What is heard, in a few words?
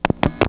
crossbar connect sound